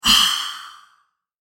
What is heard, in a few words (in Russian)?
zvuki volshebnoi fei